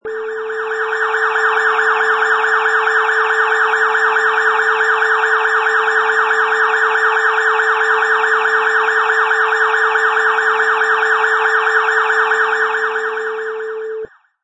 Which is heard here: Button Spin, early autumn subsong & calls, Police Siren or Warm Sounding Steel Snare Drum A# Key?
Police Siren